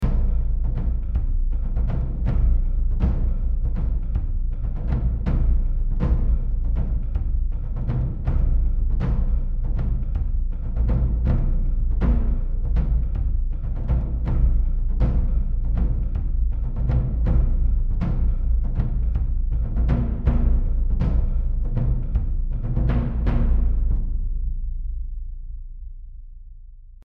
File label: lone march, Modern Percussion; Audio Ollie LA Modern Percussion 1.1 KONTAKT